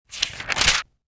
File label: page turn